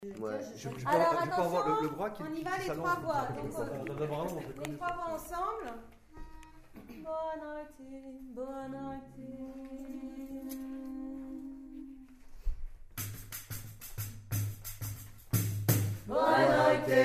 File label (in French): Baiana 3 voix